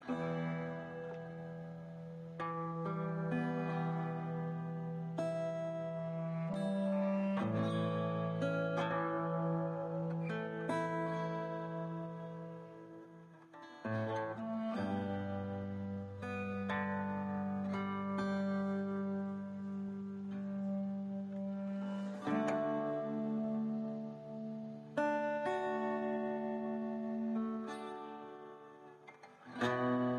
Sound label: loop Neutral